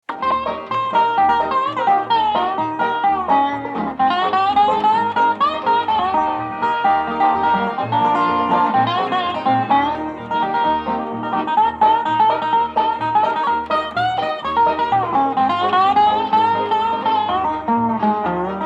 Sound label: Instrumental